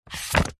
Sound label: household book thick hard back cookery page slide turn; zapsplat